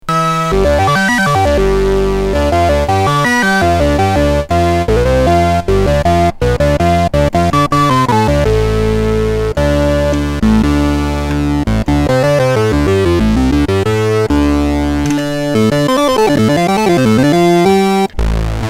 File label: korg mono poly